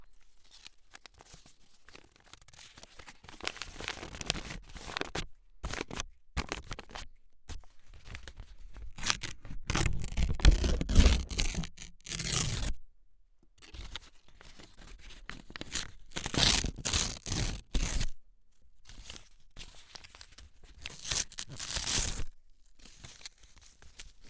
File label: Unfolding Ripping Paper